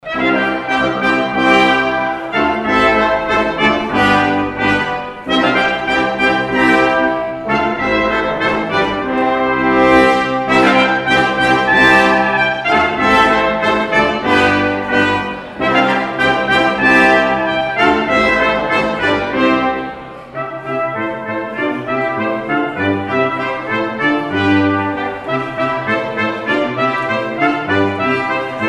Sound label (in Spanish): Fanfarria, Quinteto Vientos; Grupo Quinteto Vientos Alabarderos